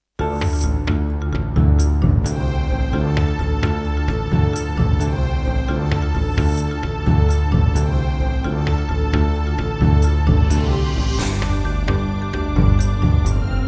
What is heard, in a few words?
Serdaco E-Wave; Heretic